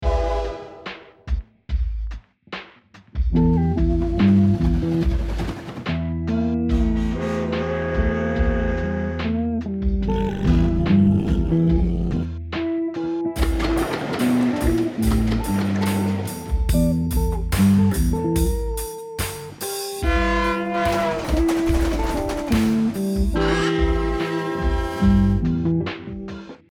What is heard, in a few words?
slo funk